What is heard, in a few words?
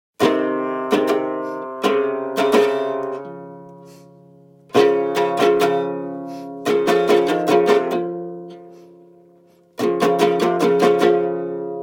Strings; Assassin's Creed Odyssey; bard instrumentals